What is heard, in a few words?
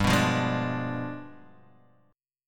G 7th Flat 9th, Guitar, Open D